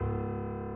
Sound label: key; keySounds